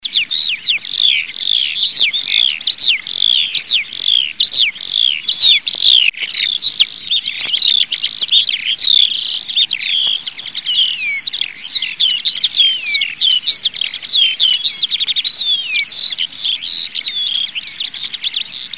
ALLODOLA; CANTI SAG NATURE 2024

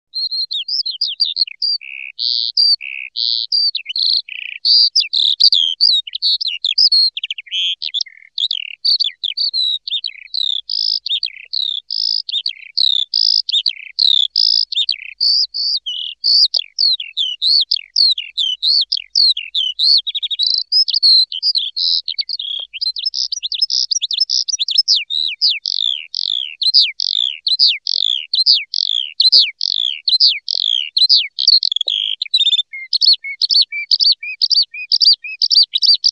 ALLODOLA; CANTI SAG NATURE 2024

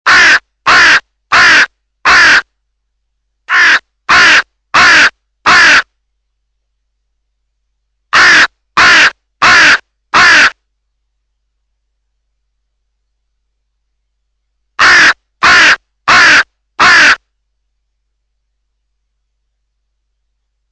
Cornacchia; CANTI SAG NATURE 2024

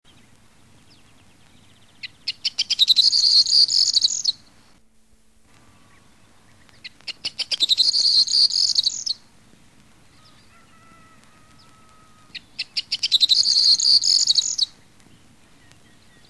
STRILLOZZO; CANTI SAG NATURE 2024

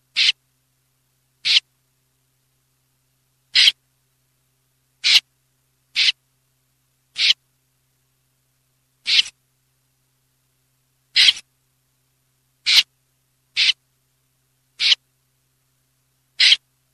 BECCACCINO; CANTI SAG NATURE 2024

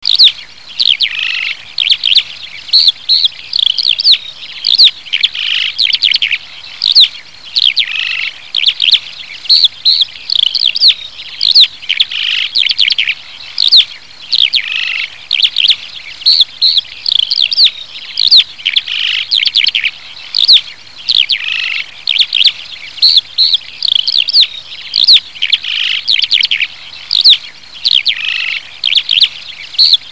ALLODOLA; CANTI SAG NATURE 2024

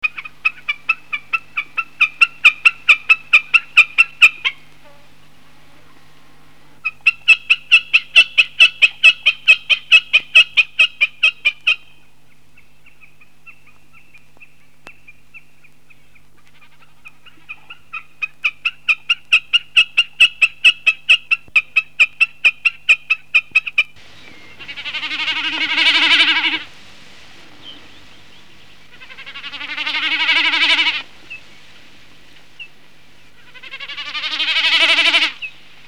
BECCACCINO; CANTI SAG NATURE 2024

